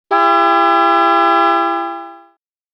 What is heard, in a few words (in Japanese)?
densyake